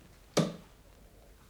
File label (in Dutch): Schakelaar